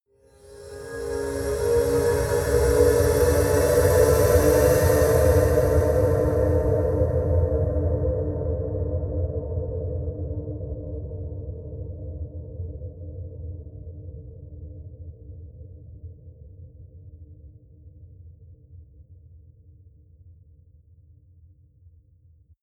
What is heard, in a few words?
rising ufo, risers